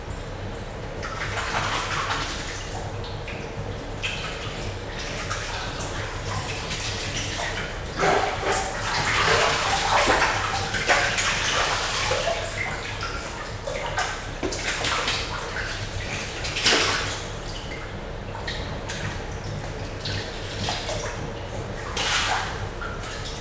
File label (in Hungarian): kispingvinek rövid hangocska zárttér, pápaszemes pingvin; jaszberenyi zoo 2015